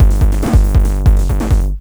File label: PW 909 140, Hard & Loud Techno